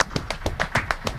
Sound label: clap loop 100bpm